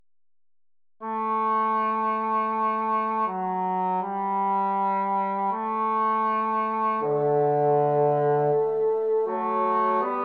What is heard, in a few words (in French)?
Sanctus, surRe, claves, Tutti; Certon; Missa Christus Resurgens; Polyphonie